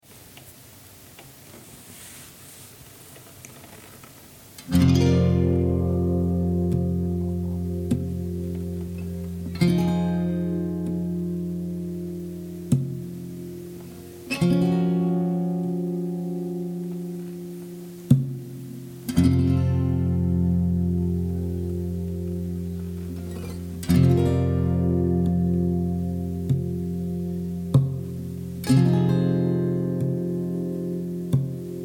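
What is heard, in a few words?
F 100 guitar chords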